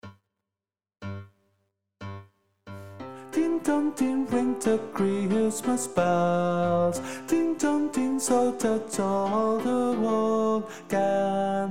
TENOR, SHOW CHOIR; ADIOSES SHOW CHOIR; Navidad 24

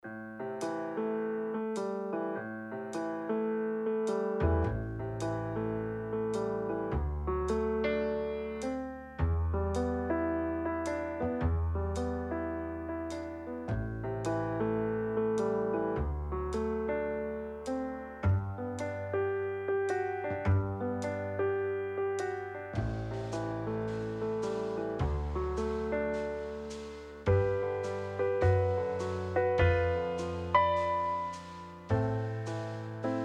BACKING

